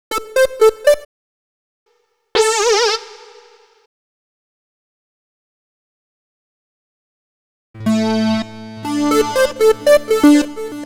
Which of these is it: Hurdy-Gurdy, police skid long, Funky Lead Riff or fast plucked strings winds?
Funky Lead Riff